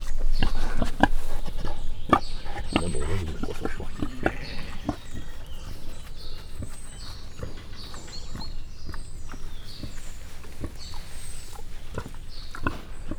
süldők, vaddisznó; miskolci zoo 2018

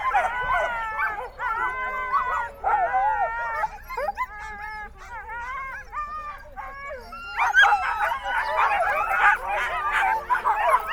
szánhúzó koncert, kutya; állathangok; paraszt udvar

